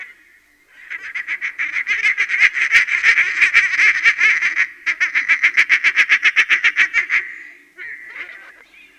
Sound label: group of mallard